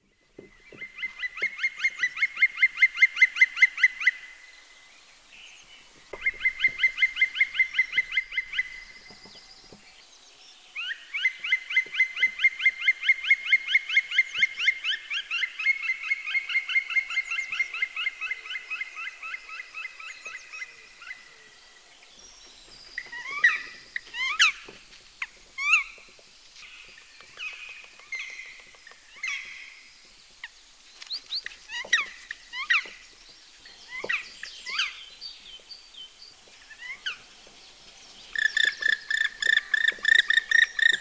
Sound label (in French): Pic noir, chants